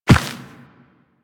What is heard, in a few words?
Walk Dirt, Footsteps; Warframe; Operator